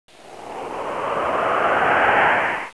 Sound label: passing; Sound Effects for Multimedia - Volume 1